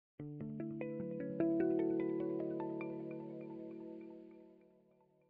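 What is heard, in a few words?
harmonic harp, Harmonics Arpeggio, electric bass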